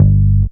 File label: BAS TON; E-Lab; X Static Goldmine 2; akai